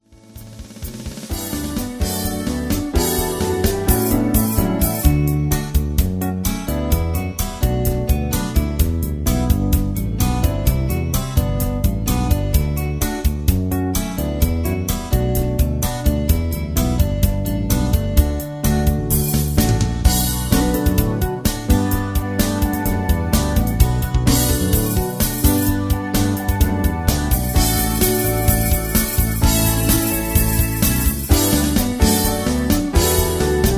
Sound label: Patter